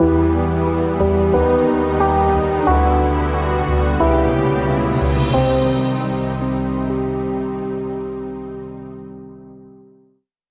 Lose

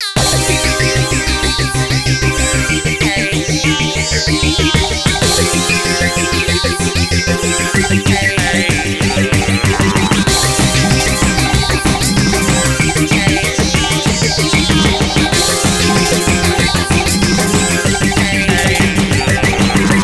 Battle Loop

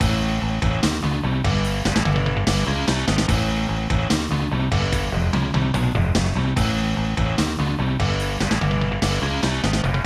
Victory Speech Villains; biker mice from mars snes

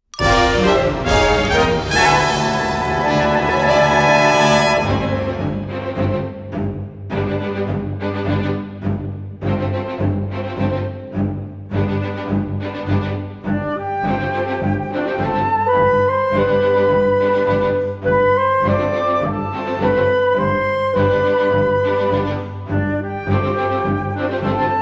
Victory Lap Fanfare; Atelier Ryza Ever Darkness & The Secret Hideout Original Soundtrack

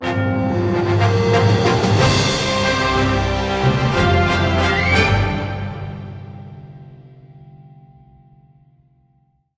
Cinematic Music Stinger; Spirit of Adventure Stinger

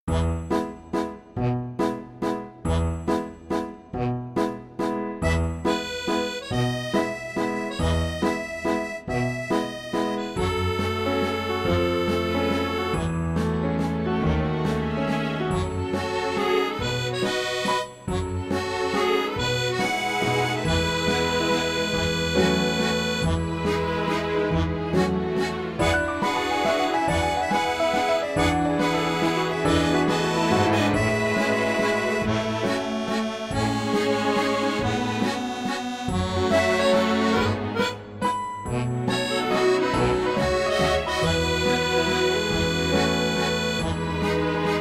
Romantic; Dandelion Wishes Brought to You

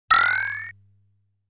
Enemy Fires a Bullet

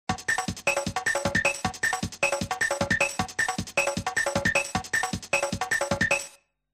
samba; Wonder Project J Mechanical Boy Pino Sound Adventure

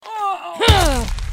Trample Loop; Goddess of Trampling

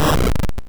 Minimize

